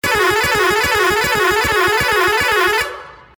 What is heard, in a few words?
TONE HIGH LOW